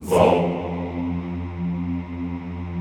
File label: Classical Choir Hmmm F1; Best Service; Extended Classical Choir